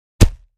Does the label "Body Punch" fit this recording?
Yes